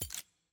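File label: button arrow forward click; champselect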